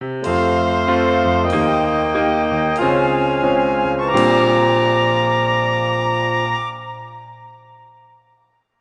Bari Sax

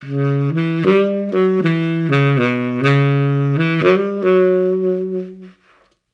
Gtr Lick

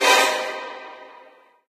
Stab; friday the 13th killer puzzle

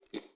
Coins

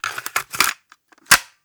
Load Cassette

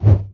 Ui Swoosh

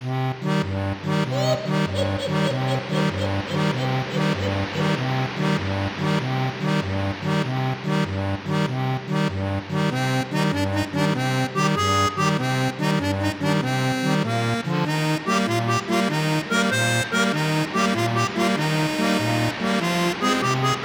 (with Ambience); Banjo-Kazooie; Gruntilda's Lair - Treasure Trove Cove

